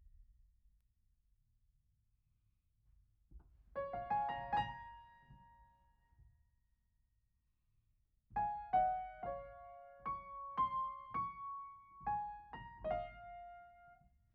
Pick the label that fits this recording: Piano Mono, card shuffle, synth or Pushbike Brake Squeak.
Piano Mono